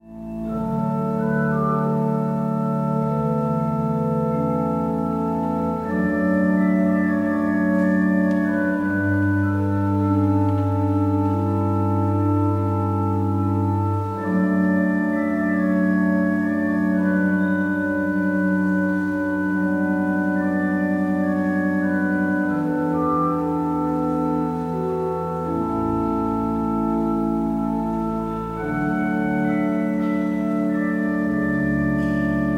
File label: oboe; Gabriels